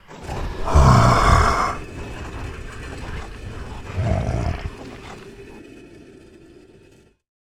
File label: phase three attack, Necromancy